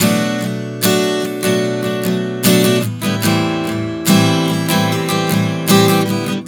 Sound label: Progression 074 Bm7-Am7, Acoustic Guitar, Chord Progressions 074 bpm; Sonic Foundry; Songwriter Acoustic Guitar Companion